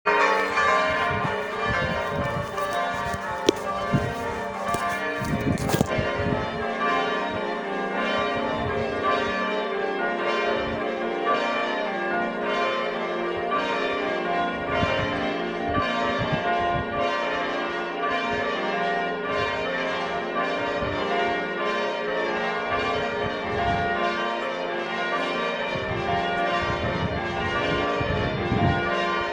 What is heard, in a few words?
Cuddesdon Bells